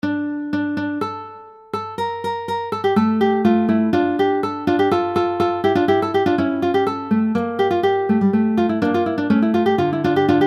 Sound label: Fuga; Cocquiel 342